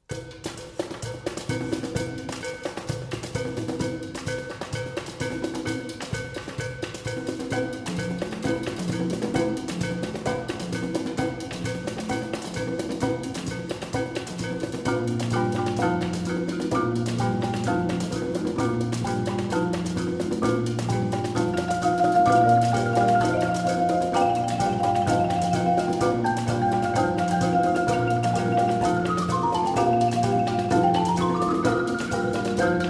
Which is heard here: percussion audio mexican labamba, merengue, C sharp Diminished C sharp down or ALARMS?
percussion audio mexican labamba